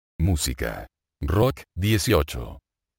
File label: rock